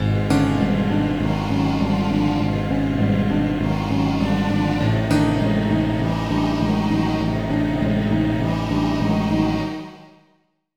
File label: Game Over; barnyard blast swine of the night; nds